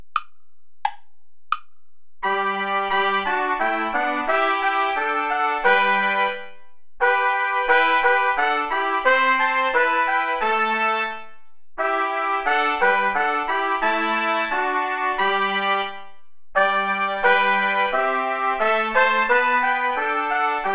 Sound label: play along without trumpet